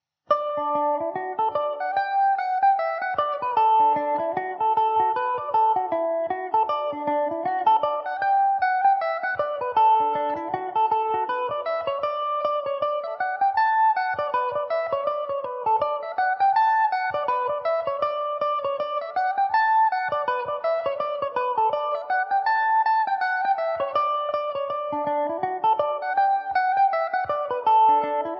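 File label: reel D major, Irish mandolin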